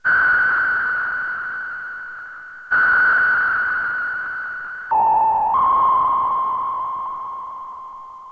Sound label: sonar; OSCar